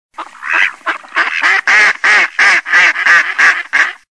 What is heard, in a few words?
Mallard Ducks